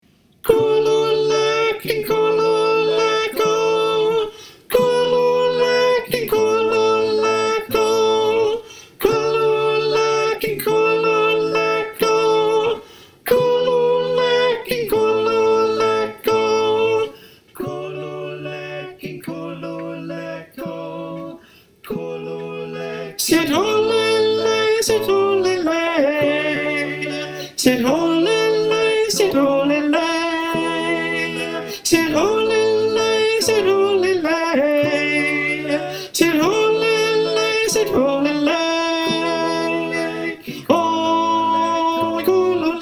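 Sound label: Soprano, choir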